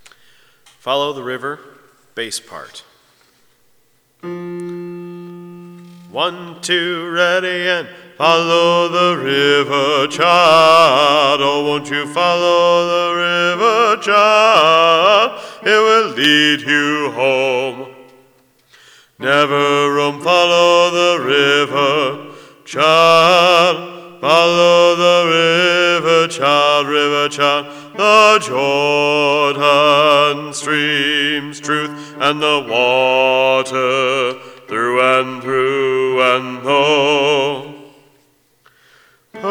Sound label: Bass, choir